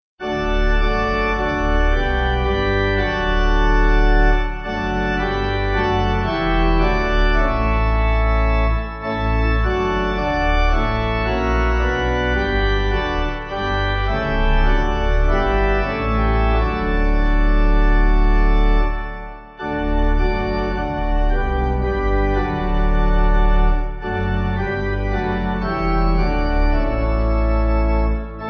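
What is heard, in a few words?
Pipe Organ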